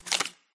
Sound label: card flip